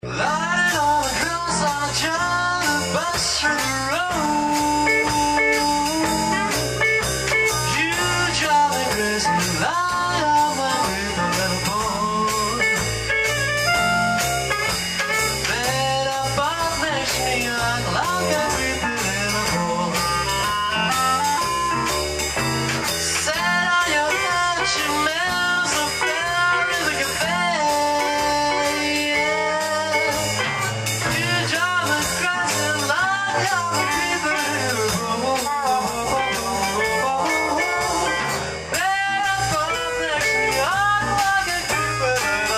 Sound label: blues